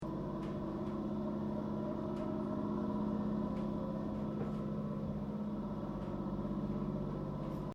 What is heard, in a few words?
Train JR Regional Aomori Local Connecting Platform Idle Loop, Japanese Trains; Vehicles